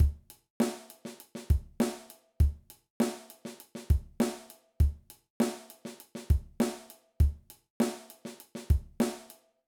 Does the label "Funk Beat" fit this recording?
Yes